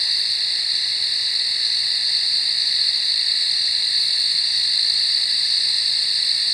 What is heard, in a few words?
Birds Loop; kult